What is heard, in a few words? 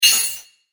Crystal Impact Spell; Magic Sound Effects; fantasy sound effect; Andrew Fleming